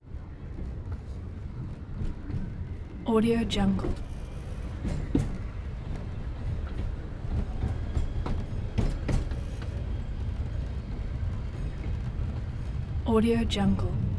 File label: steam train constant move bell rail clunks